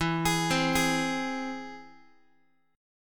E Augmented E down Guitar 4A 4E 5A 5D 5G sharp 6C sharp; arpeggiated